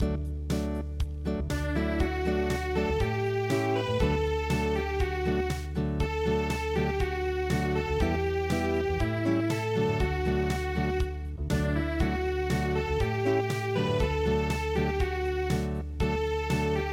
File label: Acoustic